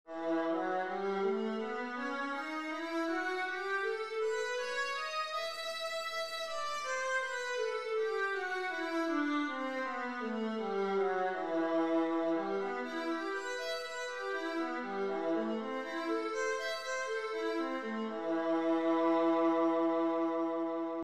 viola e minor, scales

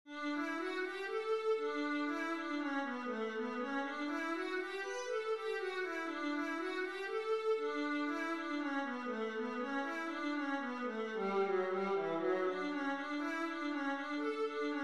vla etude, viola

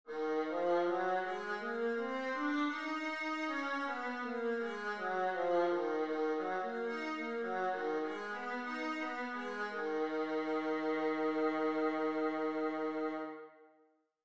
viola E flat minor; scales

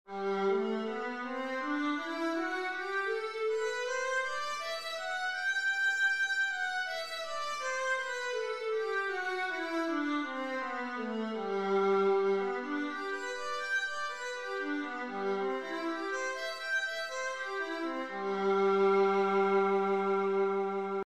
viola g major, scales

